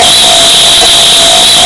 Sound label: jumpscare sound; fazbear shift 4